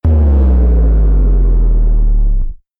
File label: dive bomb